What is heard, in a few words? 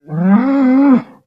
Cattle, Cow Bellow. Single Large Short Bellow. Close Perspective.